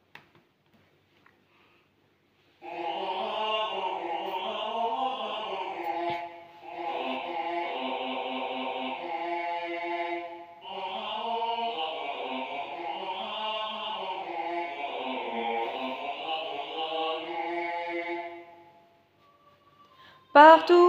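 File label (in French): chant basse, choeur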